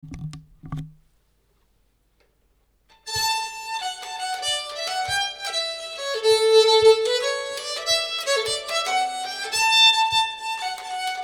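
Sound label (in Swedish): polska, fiol; Rätan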